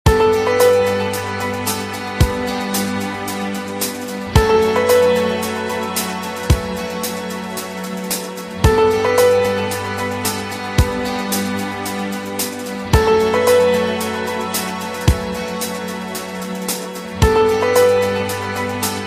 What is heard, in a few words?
ringtone; Persona 3